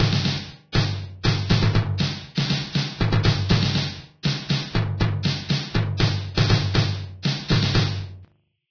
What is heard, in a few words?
drums; race-into-space